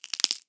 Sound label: Wood Crackle